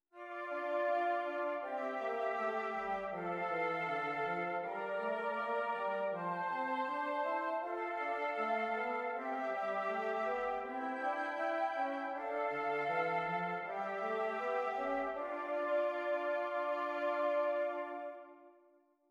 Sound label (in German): Stimmiger Contrapunkt; Ronge